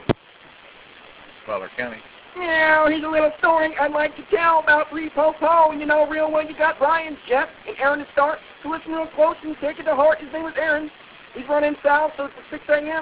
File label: singing rap